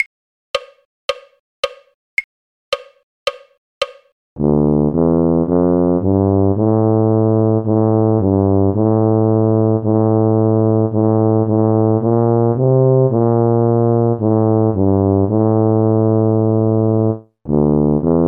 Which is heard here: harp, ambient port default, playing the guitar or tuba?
tuba